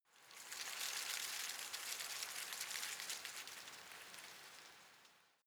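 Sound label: shrub small leaves rustle soft, garden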